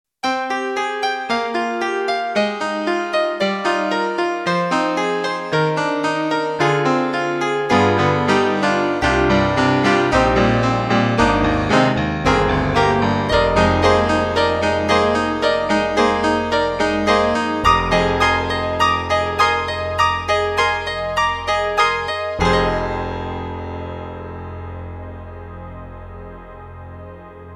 E-MU Proteus1, Vivrant Piano; PopRock

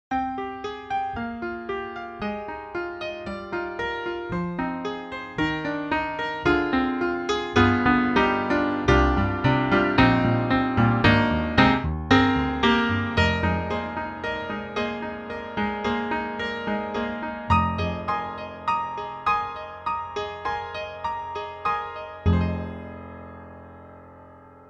Harpsi Hybrid; Soniccouture; The Hammersmith Professional Edition

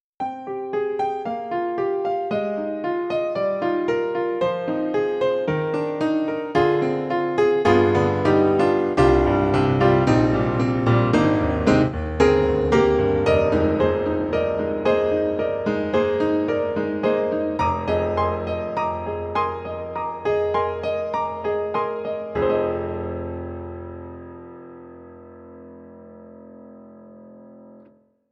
Piano; KORG; KRONOS 2

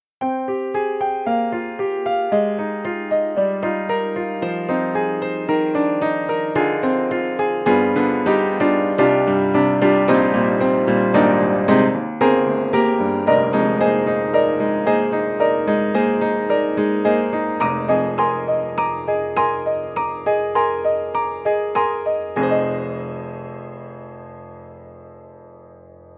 Upright Piano Too Much Whiskey; Steinberg; The Grand 3

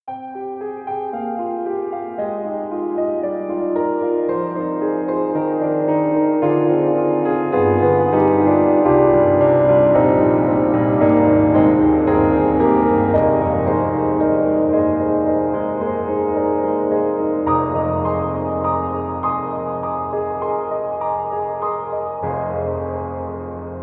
Mini Grand Empty Stadium Ambience; AIR Music Technology

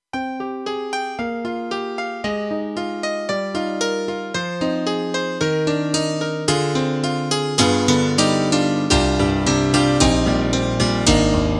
KORG M3 XPanded M1 Piano

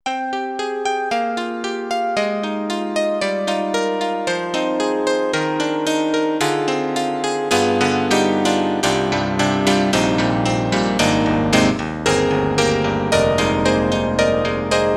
SD-90 Classical Piano; EDIROL

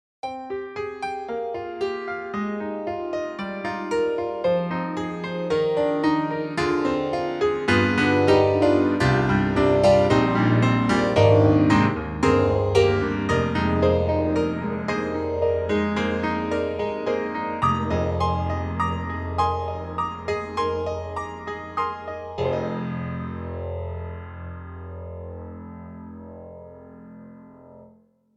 Imperial Grand, Phaser Grand; IK Multimedia; SampleTank3

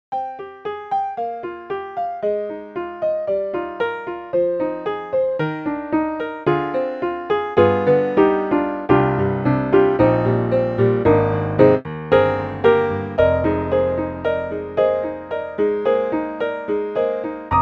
Acoustic Grand; VI ONE

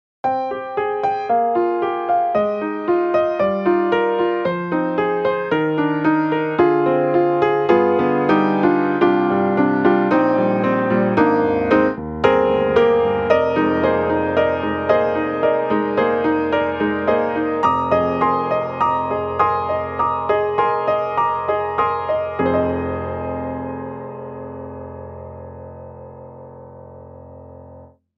Modern Upright, Mono Compressor; XLN Audio; Addictive Keys

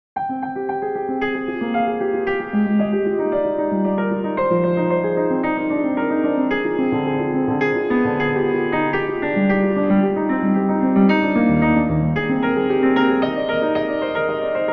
Film Score Arp; Steinberg HALion 6

